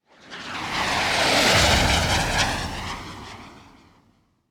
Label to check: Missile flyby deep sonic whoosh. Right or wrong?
Right